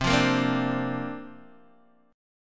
C 7th Suspended 2nd Suspended 4th C down Guitar DADGAD